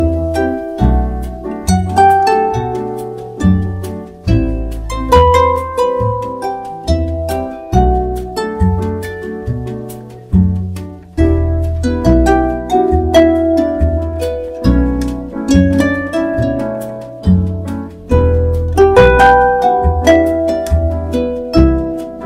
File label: Ringtone; Samsung